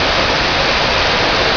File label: steam jet